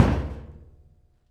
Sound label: Metal